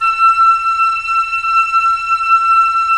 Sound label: Flute, Roland LA Composer